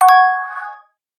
Chime; Motorola Moto G4 Plus

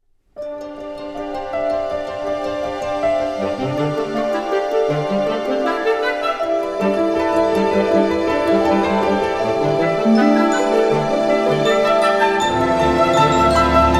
Shimmering, Orchestral Ringtones; Nokia; Nokia Design Team

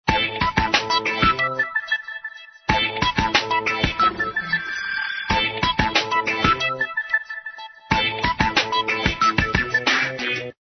Tone; LX160

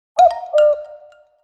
Wood clock alert; Nokia; Audio themes

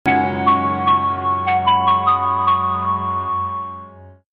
boot audio; TCL Telenor T400M

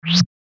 dice, Game Sound; LG GD900 Crystal

